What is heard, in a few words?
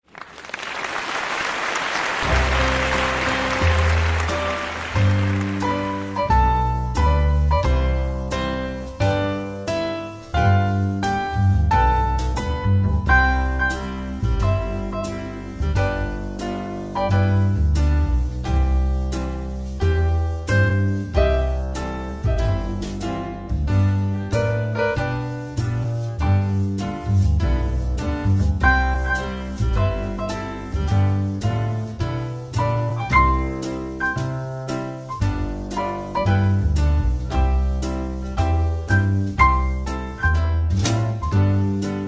Can-can for boomwhackers accelerando